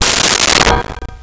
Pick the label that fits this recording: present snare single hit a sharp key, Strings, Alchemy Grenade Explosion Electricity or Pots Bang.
Pots Bang